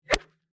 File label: Golf Swing, Sports, Golf, Swing, 7, Iron; Nike